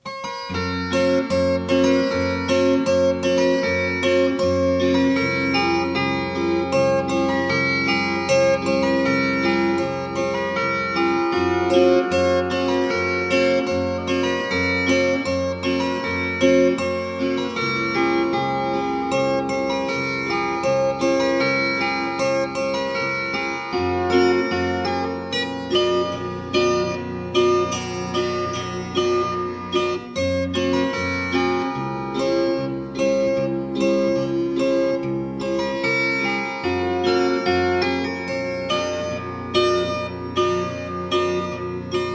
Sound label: polka; Imeliku